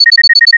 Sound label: Alarm